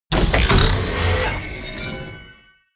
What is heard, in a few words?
sci-fi collect loot light; hits impacts